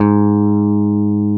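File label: Bass Electric; CMI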